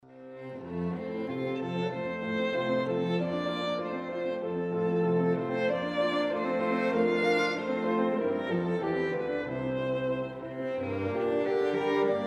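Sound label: piano trio; jadassohn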